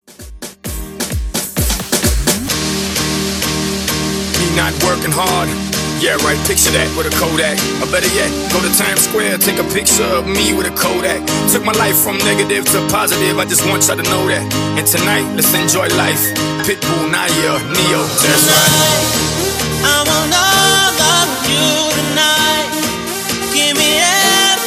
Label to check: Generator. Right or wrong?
Wrong